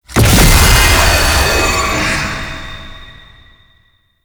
unholy punch parry; Roathe; Warframe; Tau; Abilities

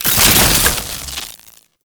Alchemy Grenade Pickup Electricity; Entrati

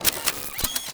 Alt Mech, pistol; Crp BE Plinx; Blastor Pistol

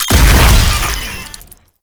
Proto Infested Maggot Explosion

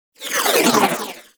Igniter Pistol Proj By, Weapons; Warframe; Corpus

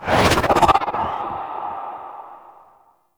Exalted Bow Equip; Warframe; Ranger

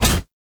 Scan Impact Pre; Frumentarius

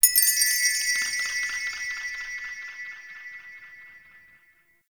Interior Orb Station Chime; Warframe; Tau; Orbital Station

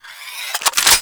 Shotgun Reload Spin Stop; Warframe; Zoboz Shotgun Skin

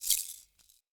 Banglenut Rattle Medium A

